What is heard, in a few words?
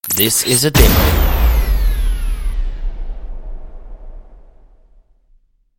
Electric Glitter Boom